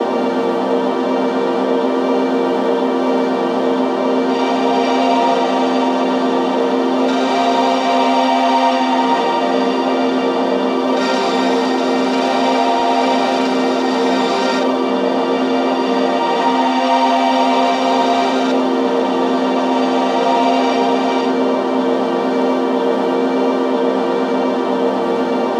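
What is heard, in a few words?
pad loop lovely 150 C minor; Virtual Riot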